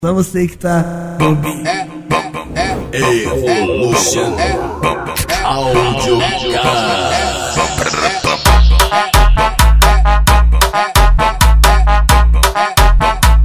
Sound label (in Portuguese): especial funk